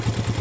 Vehicle Motorbike Idle; Playable Tutorial Level

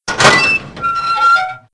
Metal Cage Open; Playable Tutorial Level

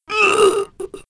Choke; Playable Tutorial Level

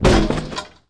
Vehicle Jeep Front Impact; Playable Tutorial Level

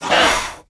Raptor Attack; Playable Tutorial Level; TR3

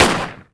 Pistol Fire; Playable Tutorial Level

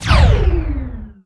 Blast Circle; Playable Tutorial Level

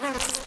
Wing Mutant Attack; Playable Tutorial Level; TR3

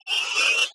Big Scorpion Scream; Playable Tutorial Level

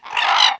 Vulture Death; Playable Tutorial Level; TR3

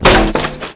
Vehicle Impact; Playable Tutorial Level; TR2

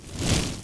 Swinging Flames; Playable Tutorial Level

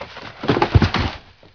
Vehicle Speedboat Body Slump; Playable Tutorial Level; TR2

